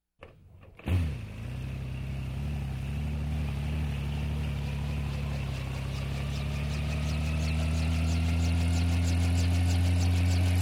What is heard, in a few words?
Mini Chopper Engine Start with Propeller Noise